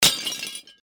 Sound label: breakpot; Keep Out! A Dungeon Adventure for the Brave